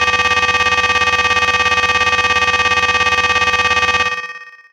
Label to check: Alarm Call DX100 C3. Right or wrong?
Right